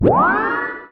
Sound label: Rpg powerup